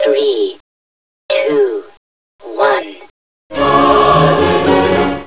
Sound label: timer